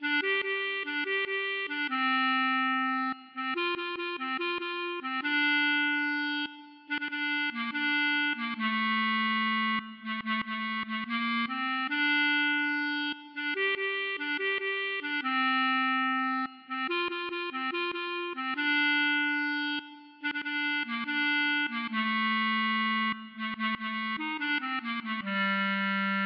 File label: klezmer